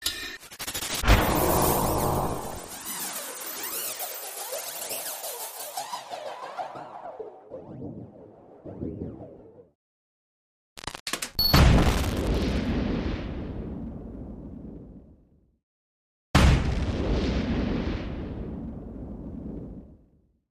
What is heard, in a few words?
Scratch Crunch Hit